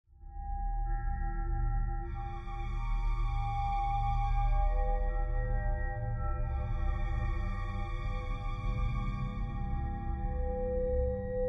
Horror Shimmer Bed Strings; Trailer; Texture; LYN115 Trailer Production Elements - Texture 10

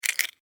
Insect, Claw, Grab, Snap, Movement, Bug; Animals:Insects

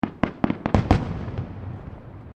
Fireworks Small Display Firecrackers; CraftedSignals

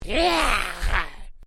Created creature small yech; Created creatures, vocalisations and pretend speech; Aliens

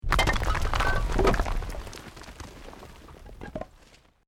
ROCK DIRT SLIDE SMALL; Created dramatic effects of buildings collapsing or breaking

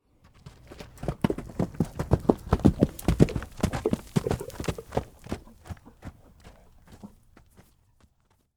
Horse Clydesdale, Pass, By, Trot; Animal; Run; Horses; BlastWave

